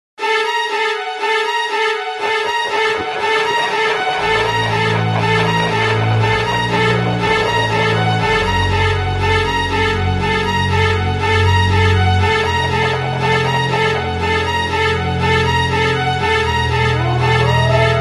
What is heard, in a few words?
Creepy Ringtone